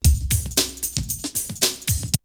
Drumloop; ZERO G - Datafile DISC 3

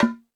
FX Sounds, Drum Machines E-MU Pro-Cussion

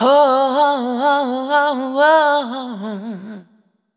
Single Voice Melodic Loops; Singles

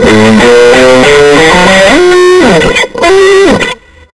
SOLO GTR, GUITARS KIT; Big Beat Samples & More; AKAI

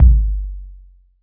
FX2 MIX KICK

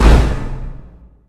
STAB

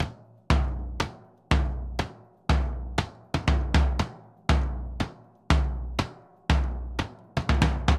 Bombo Samba 120 bpm; Best Service; Festa Latina VOL-1

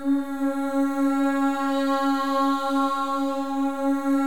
MOVING V3, Symphony of Voices; Spectrasonics

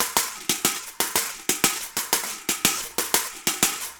reco-reco, DRUMS SAMBA; Best Service ProSamples vol.14; AKAI

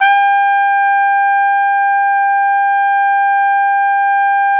Flugelhorn; RCA; Roland L-CD702

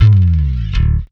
Electric Bass; Sonic Foundry (Sony Creative Software); Knutrix Drum & Bass

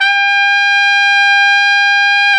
BRS RCA TP, Tp 5 RCA Jaz; Roland L-CDX-03 Disk 2

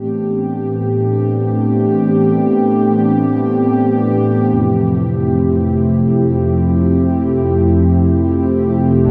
NRG Pad

